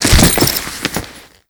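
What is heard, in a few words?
destruction luggage burst; Explosions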